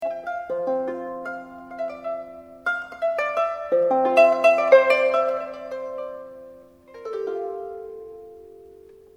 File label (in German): harfe